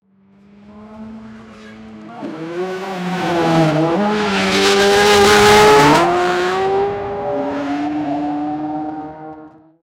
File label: loud violent skidding of, car stopping